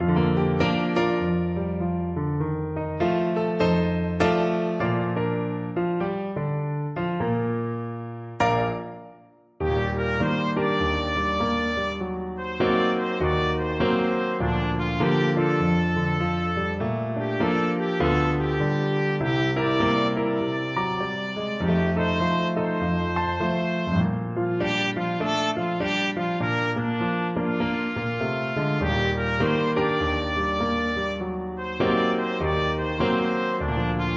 trumpet

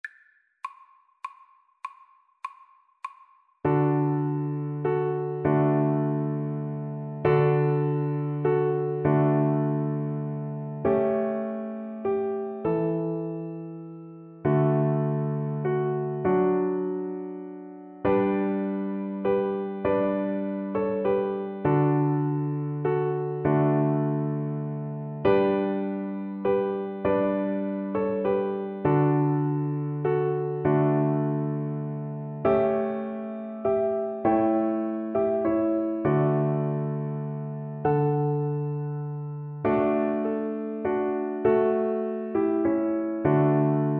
DB, Double Bass

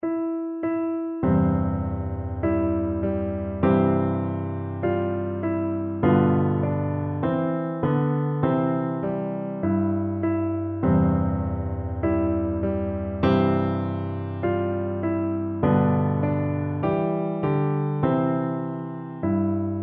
ainsi font, piano duet

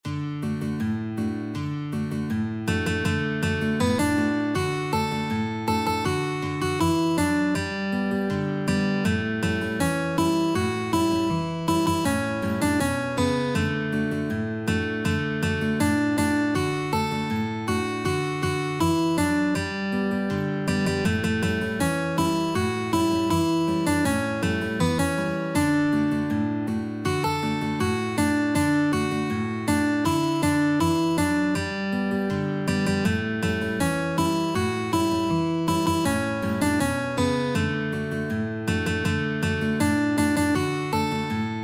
guitar duet

